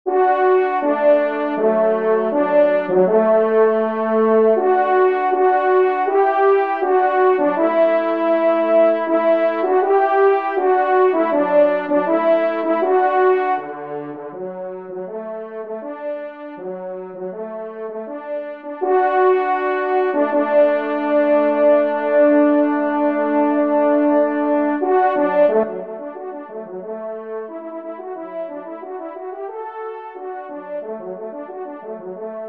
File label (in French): polka, trompe; Polka des joyeux piqueurs